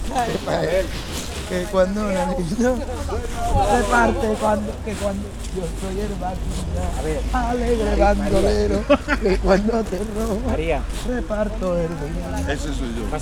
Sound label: chatter, tavern; Loops